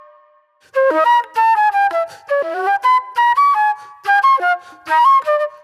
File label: Wild Flute